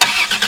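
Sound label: Engine Startup, Transportation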